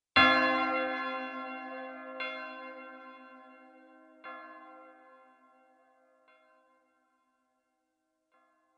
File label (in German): hoch glocke ton massage